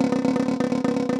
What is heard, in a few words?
SnareDrum; 膜鳴楽器; 打奏; SnareDrum-2RollPlay